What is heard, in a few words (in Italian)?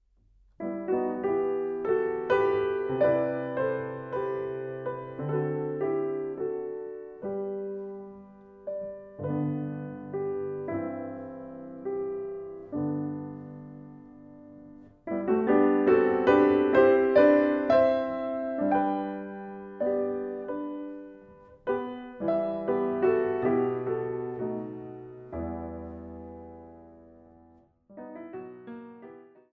Winterlied (versione per pianoforte)